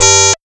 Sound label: HONK; Best Service Dream Experience; SF+EFFECTS